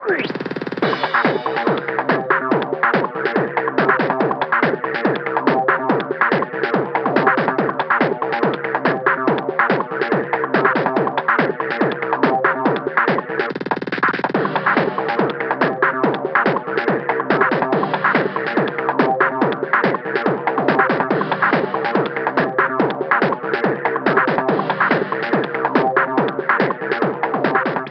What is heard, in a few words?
Boom Box Track loop lo